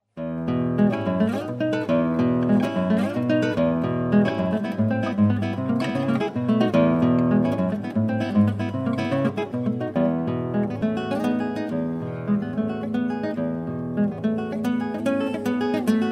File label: Romantic Guitar